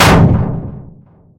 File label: metal crash; STALKER; IWP